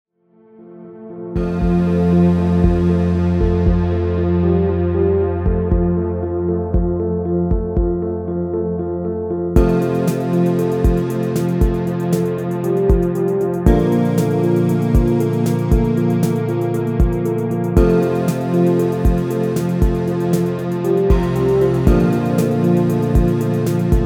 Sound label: 117bpm